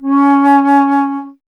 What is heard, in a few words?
Alto Flute